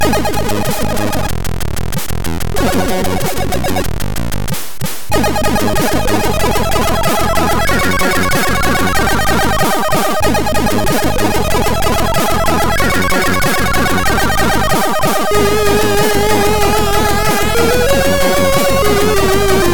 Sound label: tennis arpeggio; Music Assembler